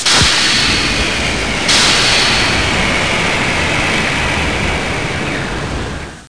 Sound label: shuttle